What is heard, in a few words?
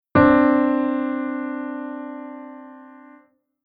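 Chord Interval Major 2nd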